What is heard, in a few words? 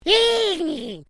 Created Creature Small Call, Alien Sound Effects